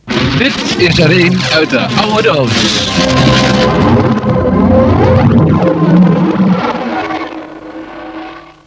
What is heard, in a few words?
sun Shine Jingle; SunshineJingles